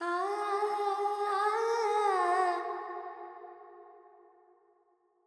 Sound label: Pentatonic, Vocals Carnatic Female Alaps 70 G; Sample Banks; Verb